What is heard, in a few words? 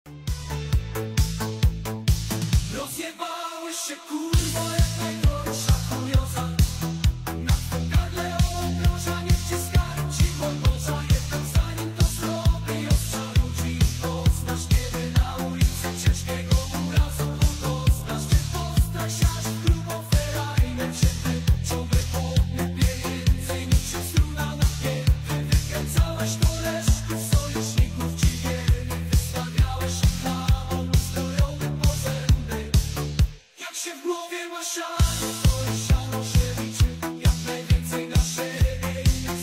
brutal disco